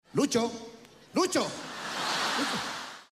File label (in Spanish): risas